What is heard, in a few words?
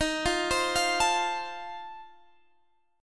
F Major, D sharp; F/D#; arpeggiated